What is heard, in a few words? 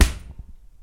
Thump Treble